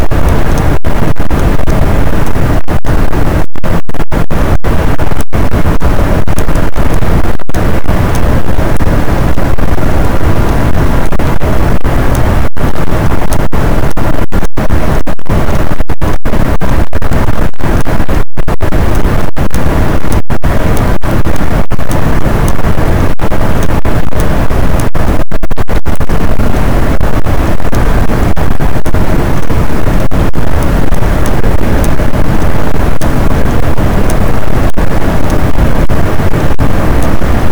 cachalot positif, boat, Physeter Macrocephalus

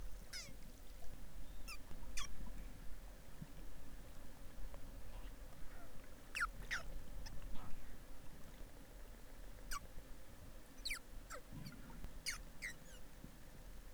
Appels pulsés; globi

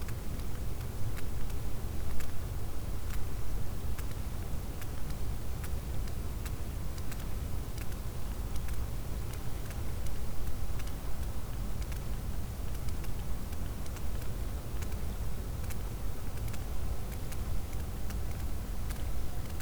cachalot positif, Physeter Macrocephalus

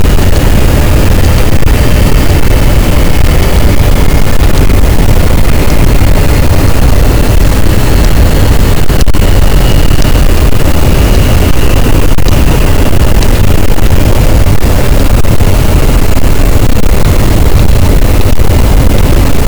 cachalot, Physeter Macrocephalus, gaussian noise

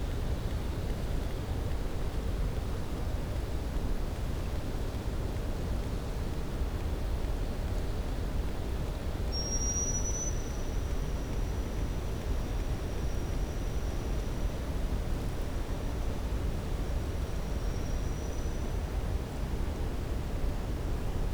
cachalot positif, Physeter Macrocephalus

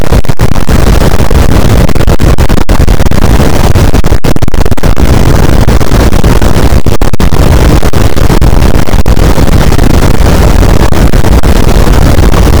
cachalot negatif, boat, Physeter Macrocephalus

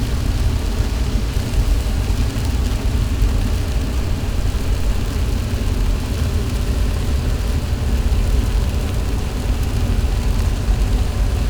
cachalot negatif, Physeter Macrocephalus

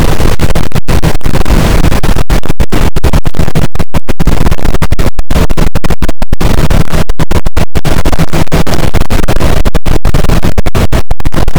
cachalot positif, boat; Physeter Macrocephalus

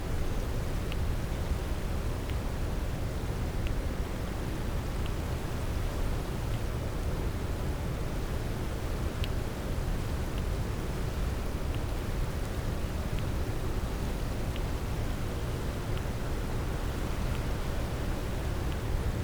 cachalot negatif, Physeter Macrocephalus

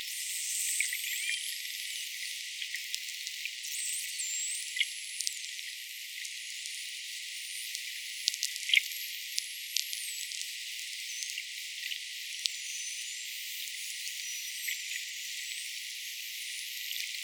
Dauphin Commun

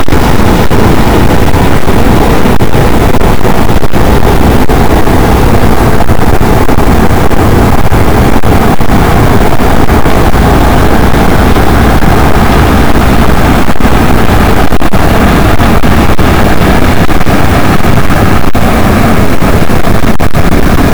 cachalot, gaussian noise; Physeter Macrocephalus

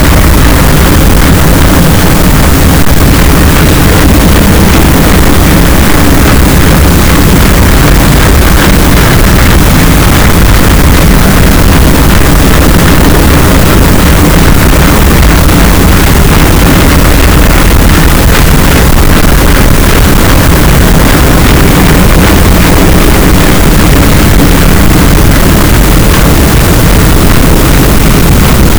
cachalot, gaussian noise; Physeter Macrocephalus

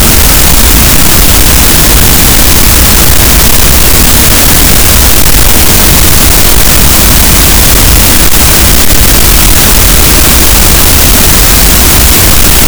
cachalot, Physeter Macrocephalus, gaussian noise

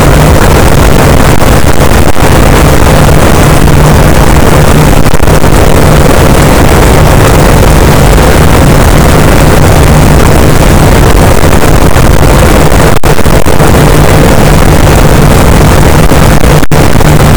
cachalot positif, boat; Physeter Macrocephalus

